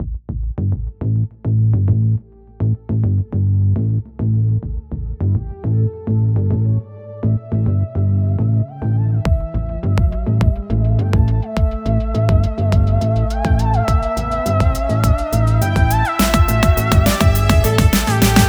Funk Intro; Funklift

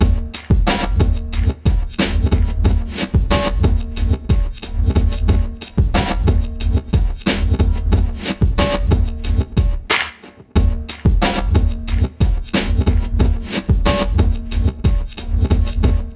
highway stage drums

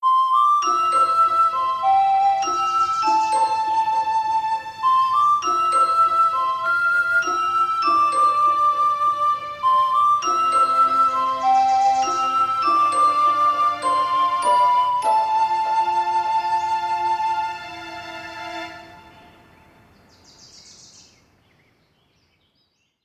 Jingle 1 (Peaceful); FreezeME

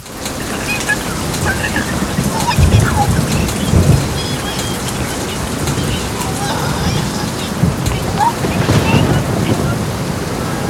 Sounds Cinematics, Intro

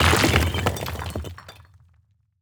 time travel break; teardown; ps5 windows xbox series xs

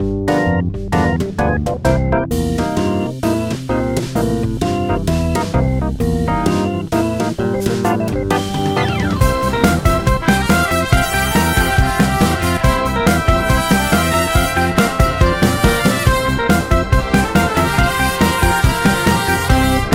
cinematic stage clear

